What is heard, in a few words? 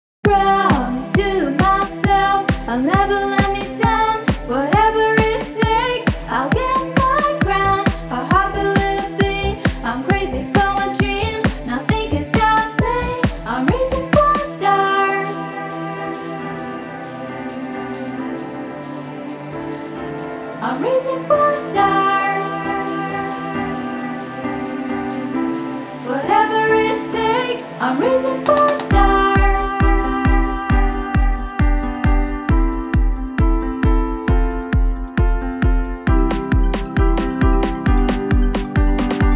Post Mix